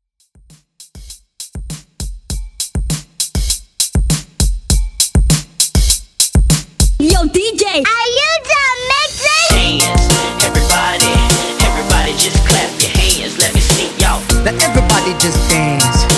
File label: 90s Hype ReDrum 100Bpm; remixplanet